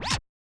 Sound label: Yamaha DJX 2B, Scratch F